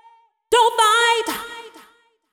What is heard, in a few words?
deep house female, 125, A, vocals